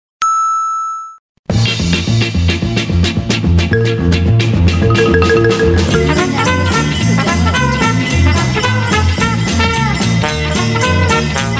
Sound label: two guitars